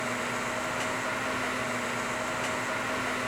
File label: Fan Sound